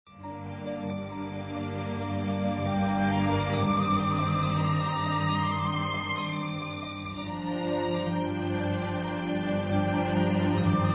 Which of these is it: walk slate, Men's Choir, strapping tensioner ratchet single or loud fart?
Men's Choir